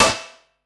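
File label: snare drum, Drum & Bass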